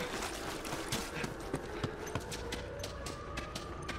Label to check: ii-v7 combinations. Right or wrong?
Wrong